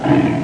One-eye; Yipe! III; Monsters

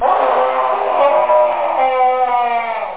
cry

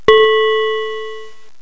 bell, notes; ClickArt 300,000

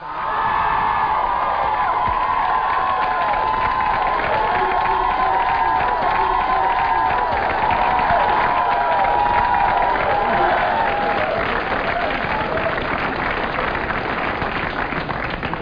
Nice Long Clap

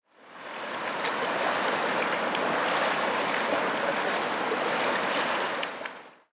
water

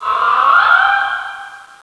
ambient; POOL CHAMP

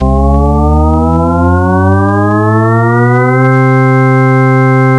Graph; 桃源紀行DEMO; RODIK

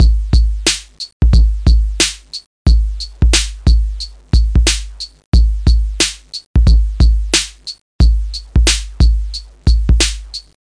Box Tech 90; Sonic Foundry; Funky Xtreams II

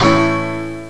A Chord; Glider PRO

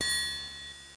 Sound PING

